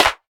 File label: clap sample e key